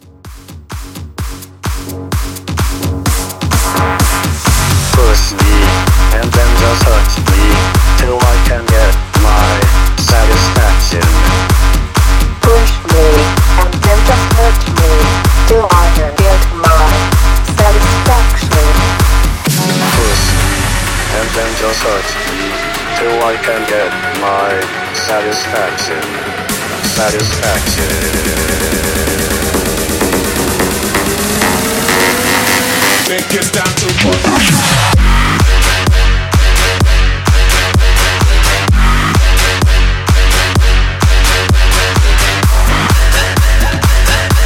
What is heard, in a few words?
Big Room Bootleg 128